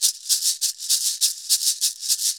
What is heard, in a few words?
Cabasa 100 bpm; Best Service; Festa Latina VOL-1